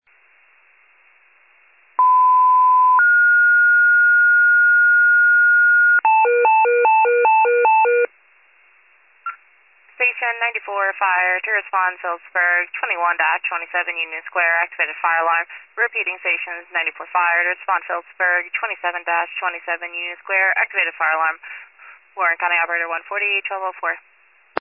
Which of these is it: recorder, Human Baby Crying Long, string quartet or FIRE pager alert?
FIRE pager alert